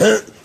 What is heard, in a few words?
huh, Voice